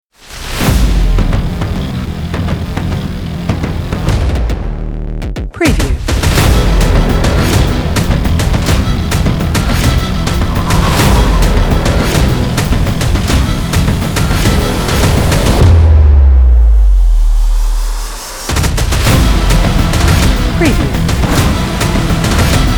Cinematic Hybrid Guitar Experimental Modern Trailer Music Intro